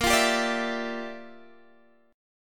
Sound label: G Minor 13th A sharp